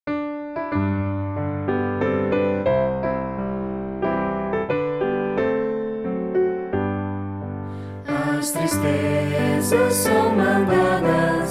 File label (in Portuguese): voz piano